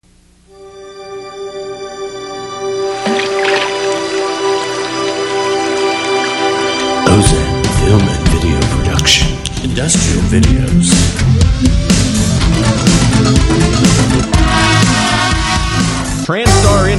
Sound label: Industrial audio tracks